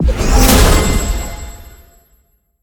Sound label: summon; smite; tiamat